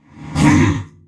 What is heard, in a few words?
Wound; Deathbringer Saurfang